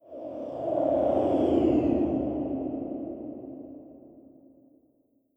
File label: amb city planet flyby; Stellaris; megacorp